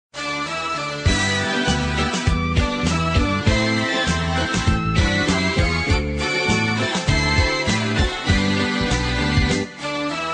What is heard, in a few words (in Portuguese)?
musica tema; a praca e nossa